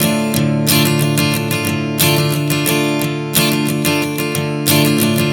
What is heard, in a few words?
Strum 090 Dm, One Chord Strumming 090 bpm